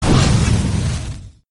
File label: fireball sound; Arc of Templar